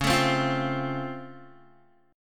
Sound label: Db Augmented Major 7th, down, Guitar